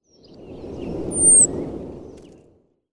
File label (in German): zaunammer